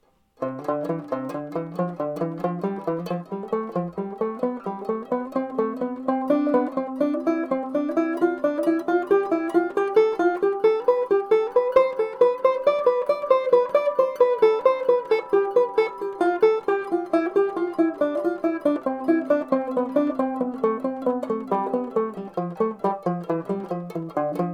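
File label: 3 major scales, banjo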